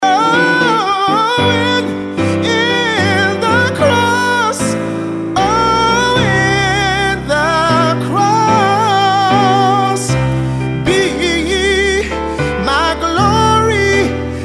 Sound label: ring tone